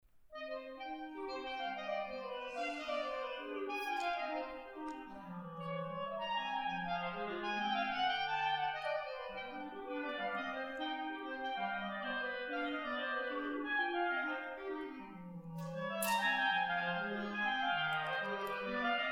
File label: clarinet duet